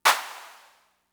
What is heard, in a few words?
Clap, Fricke MFB-522, Drum Machines